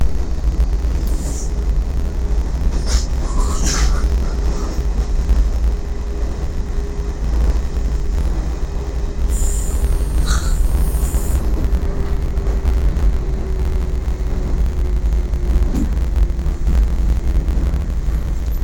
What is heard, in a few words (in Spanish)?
sonido de tren